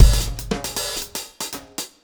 Beat, Drums, Eclectic Electronica

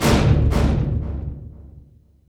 STR SNAP, String FX; Roland - LA Composer vol. 2

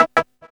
PULSE E; AMG; Global Trance Mission VOL-1

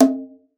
Conga; ProSamples vol.42 - Session Instruments

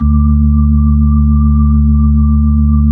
B-3 Chorus

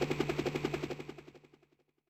Heli; Yamaha DTXpress-II

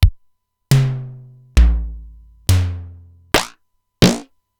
Simmons SDS-9

